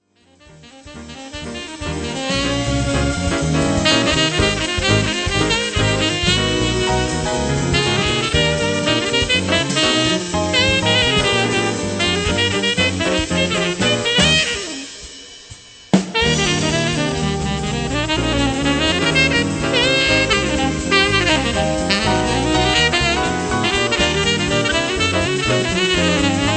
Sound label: BeBop; Jazz Trio